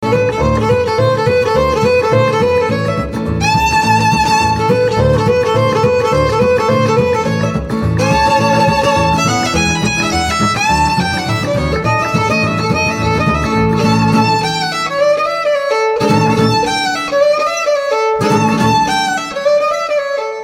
Violin Boogie

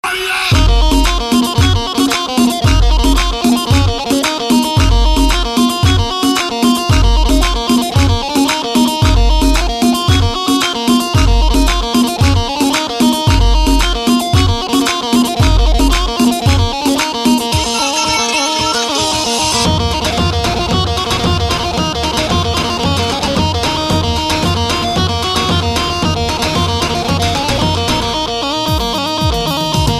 Remix Piyano

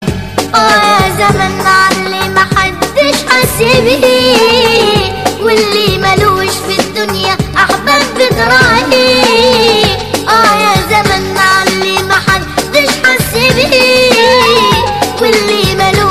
Arabic Traditions

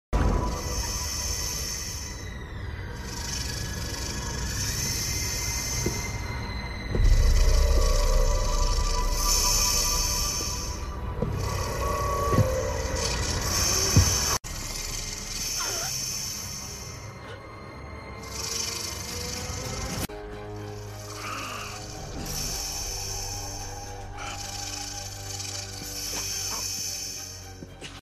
Cicada

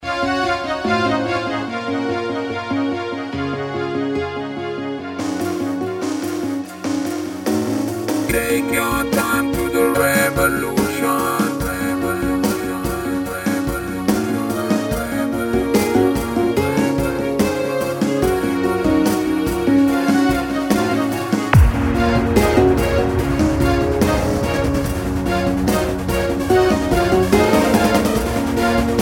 Melody

